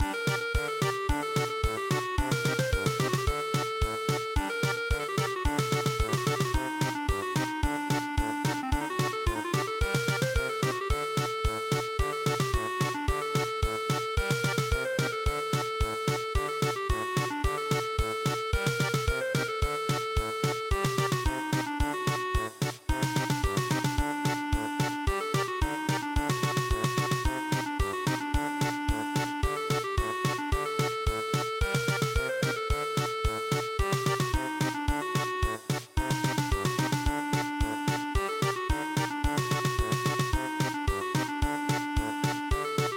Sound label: polka